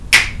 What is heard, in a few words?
Snap; inner demons